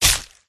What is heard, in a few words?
forest keeper attack contact bottom